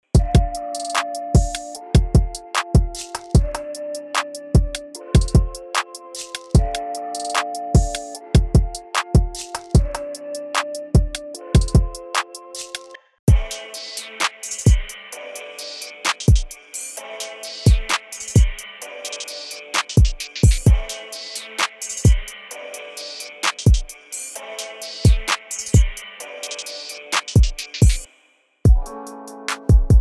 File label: trap samples; SMEMO SOUNDS